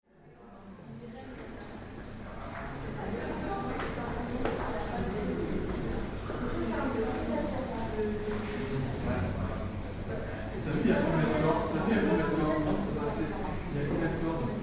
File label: stradivarius violin; messiah